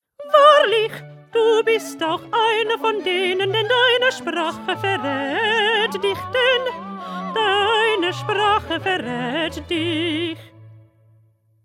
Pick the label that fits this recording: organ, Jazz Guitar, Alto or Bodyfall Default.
Alto